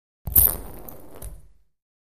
Ping Pong Ball Spinn

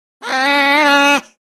Otter Sea Call Whine

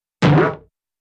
Bounce Boing Drum; impacts

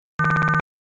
Beep Fast Drone Beep

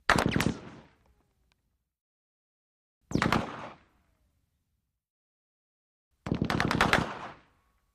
AK47 Short Bursts Imp

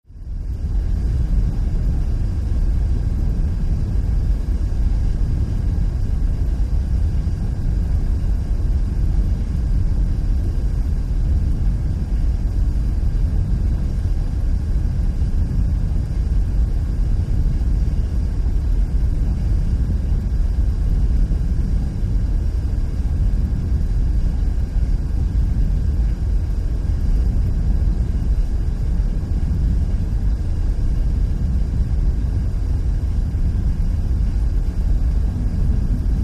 Ind Boiler Room Amb, industrial ambience

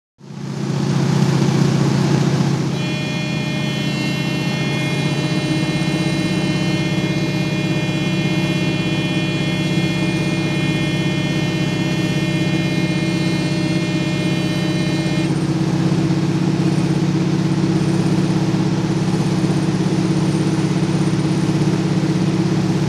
Prop Plane Interior, Interior Harvard

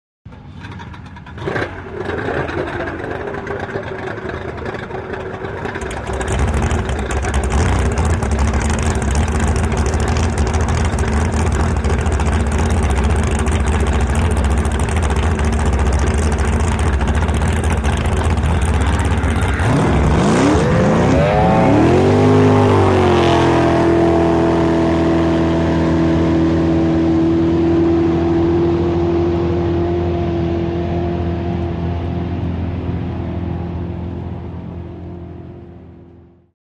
Drag Boat Start revs

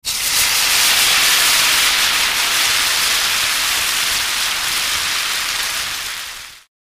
Food Sizzle Heavy Imp, cooking

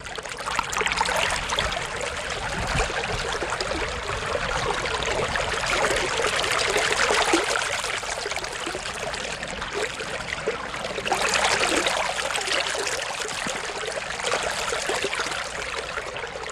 Brook Babbling Water, streams

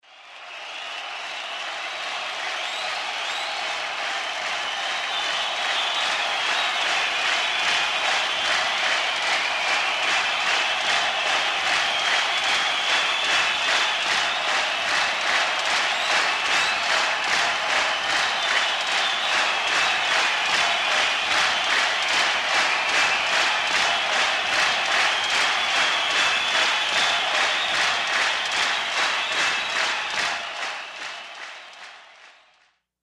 Rock concert, large crowd with rhythmic clapping

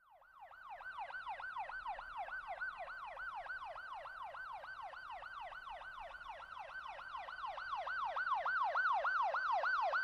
Ambulance By Medium, ambulance

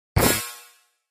Impact Elect Hit Shim

